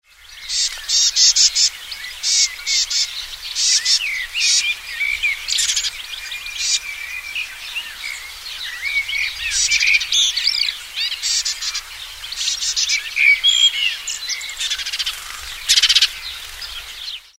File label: sombre tit